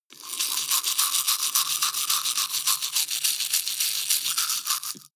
teeth brush dry open circulate

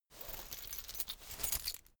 key ring large pocket fetch

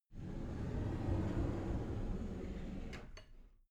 cupboard slide door open soft

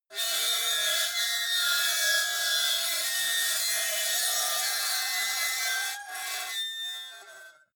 metal lid screech

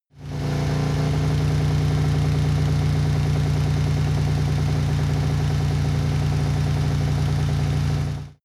motorcycle harley engine steady stutter

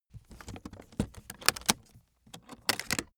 aluminium case open close

